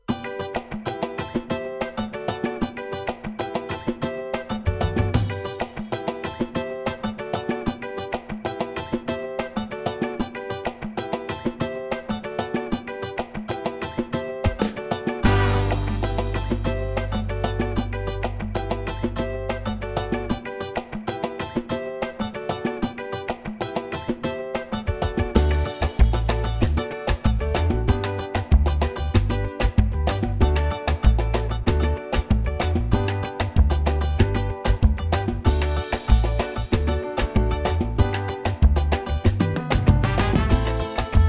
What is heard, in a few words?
Samba